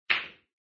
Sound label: collide; wanNingTaiQiu